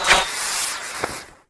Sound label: flame arrow; AoM-ARTofMyth